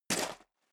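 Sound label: step leather shoes gravel medium single left, footsteps; schritte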